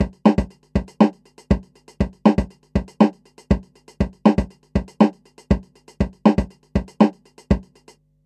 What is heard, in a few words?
Beat AKG414B